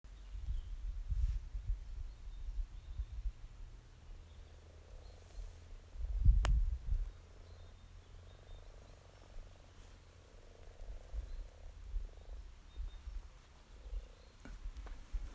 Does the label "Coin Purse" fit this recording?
No